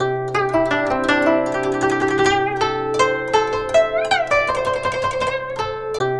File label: GUCHIN 90 C; INIS - Opium